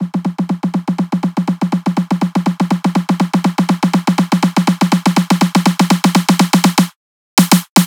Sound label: Snare Roll 122 G